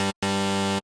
Buzzer

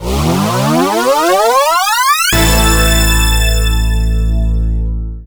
tune win; Tippin Bloks; TUNE Bloxx Win Rev

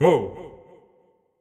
Effort Tiny; Marv

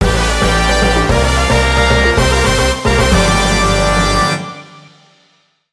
Music event; Power Pro Kun Pocket R

